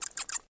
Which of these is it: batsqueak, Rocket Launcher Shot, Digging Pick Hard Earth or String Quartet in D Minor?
batsqueak